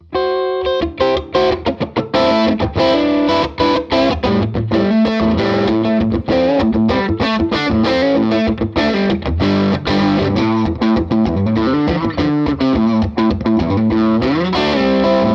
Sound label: Glas 30 2x12 Combo Gibson SG